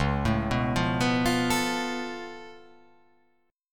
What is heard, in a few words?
C sharp Augmented 7th, C sharp, down